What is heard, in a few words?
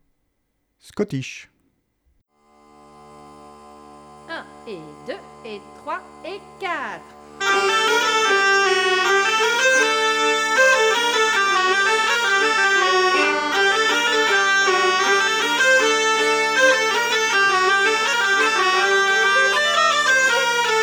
Scottish Tpo normal Inst; Se navia na mia